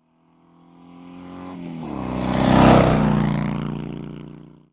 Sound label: motorbike; Blind Drive